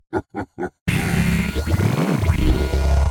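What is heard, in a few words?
Boss Special Attack Start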